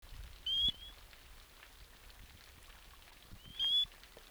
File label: Colostethus jacobuspetersi, dart frog